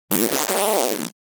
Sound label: Fart Foley At Last Thin Long; Hollywood Edge; sound designer's toolkit v2